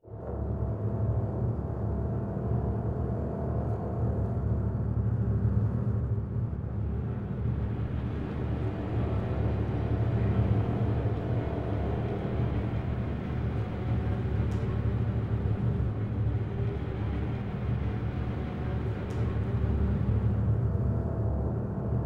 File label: Final Battle - Combo Pad